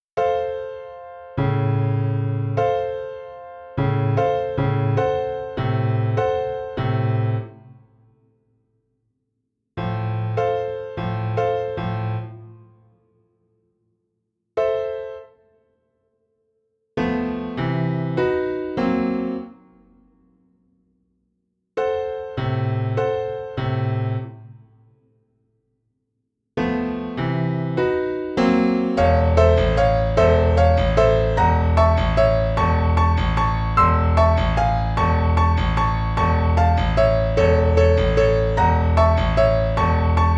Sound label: swings piano; houpacky